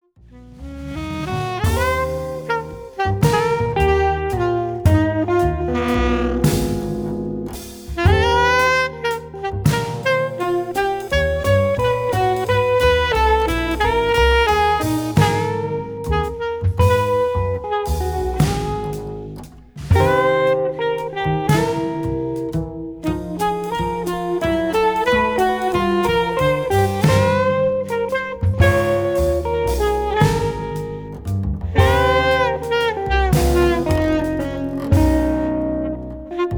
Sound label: Blues